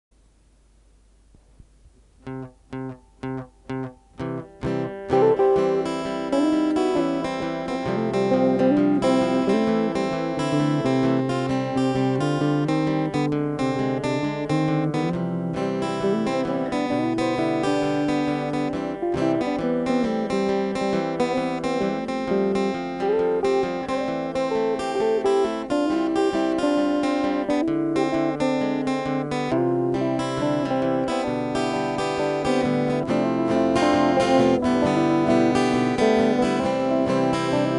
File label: Acoustic Demos Plus Bass